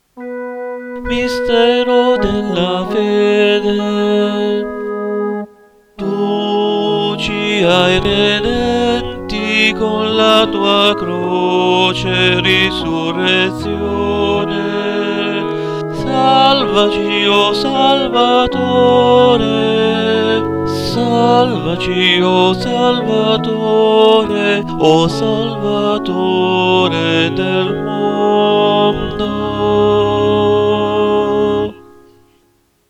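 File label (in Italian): Tu ci ha redenti Anamnesi soprano; tono 1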